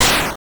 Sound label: retro die, retro synth; retro synth SFX